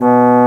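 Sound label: Bass Trombone mf B1; Digital Sound Factory Virtuoso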